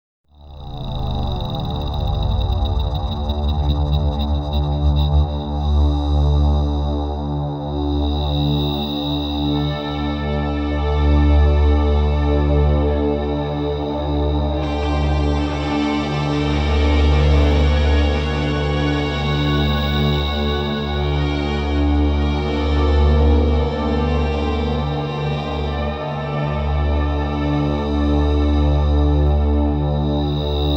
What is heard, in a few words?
instrumental